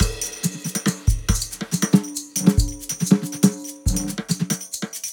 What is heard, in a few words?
heist drums